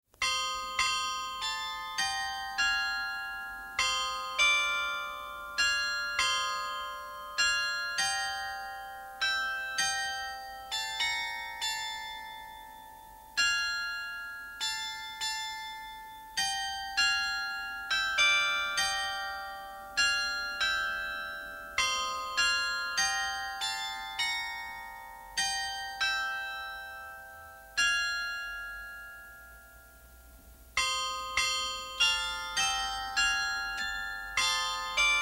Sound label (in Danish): melodi nu falmer skoven